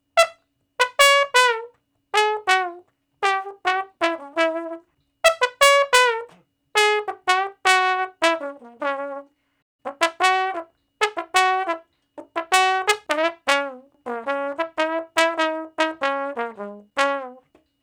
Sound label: Trombone Shuffle (Db); Sonic Foundry (Sony Creative Software); Horncraft for R&B; Solos